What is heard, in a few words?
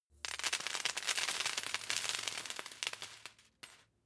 paperclip drop floor many